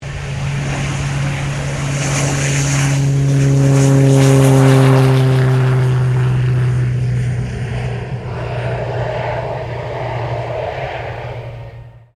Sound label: PC-9A takeoff, PC-9 Military Trainer; Airplanes (Sound Effects:Vehicles)